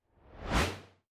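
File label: reversed whoosh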